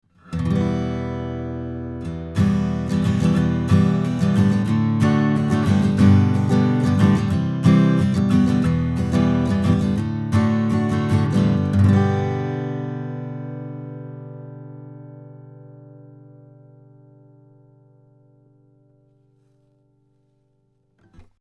Steinberg AXR4 Ac Git 20cm 15th Fret

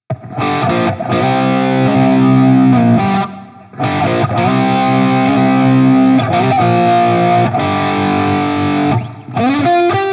NUX MG-101 Smoke Water Drive

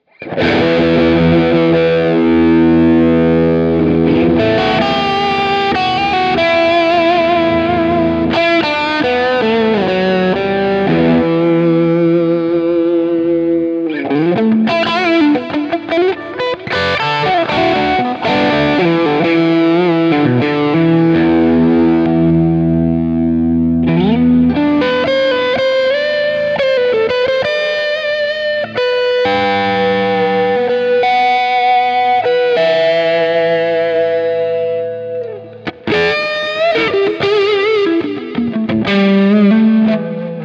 Cruchy Atmo Lead; Scuffham Amps S-Gear

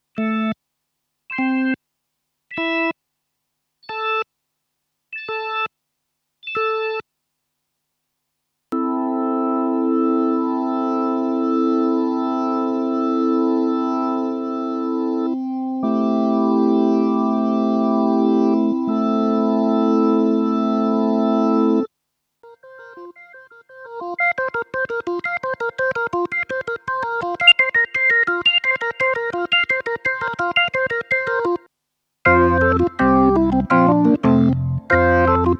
Hammond XK 4 Multikontakt Leakage Keyclick

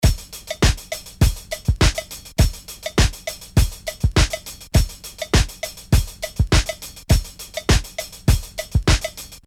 Beat processed

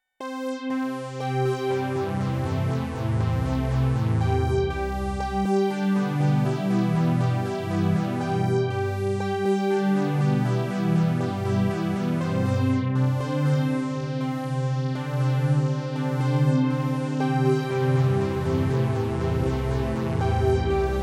NTS-3 Synth Space Echo

